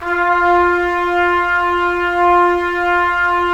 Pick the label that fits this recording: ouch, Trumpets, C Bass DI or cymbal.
Trumpets